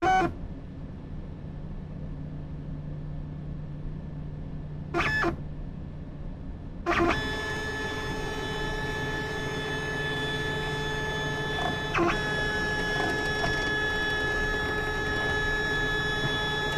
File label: Computer Scanner